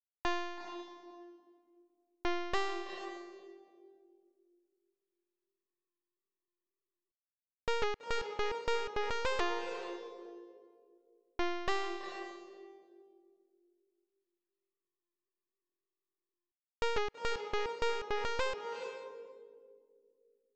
105bpm Distance Multiple Samples

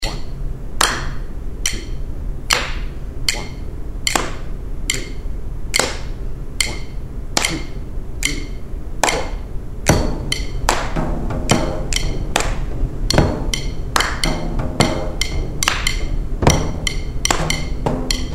bodhran time mixed

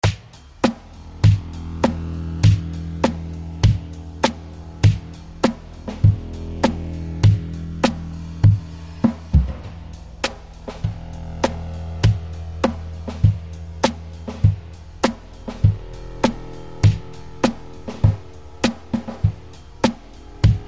E100 Groove

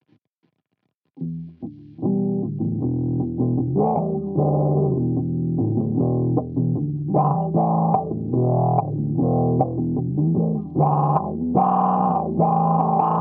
Em150 GTR motif

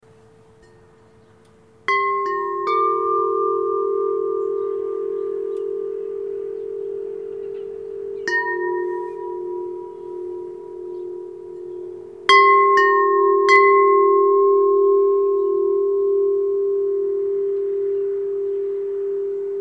Windchimes Close Up Harsh Vibration

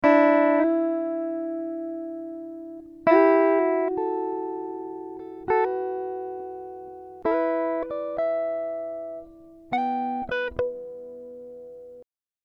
Em 100 GTR Riffs

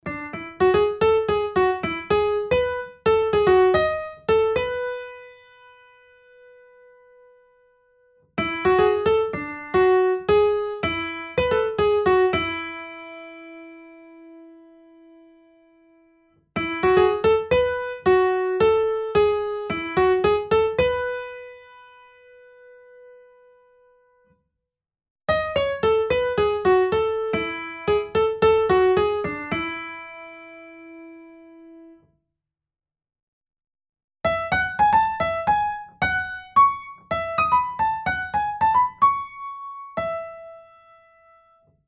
Piano

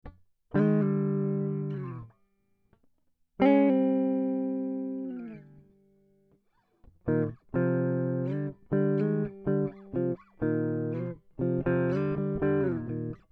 Cm124 Funky Groove

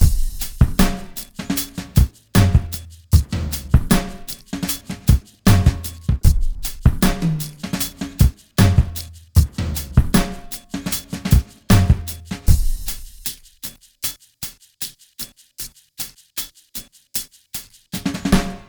Drumloop

